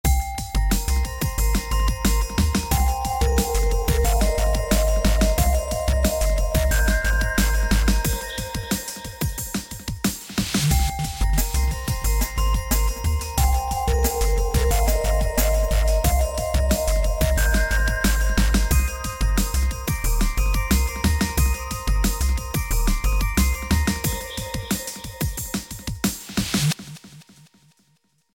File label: Morning Chimes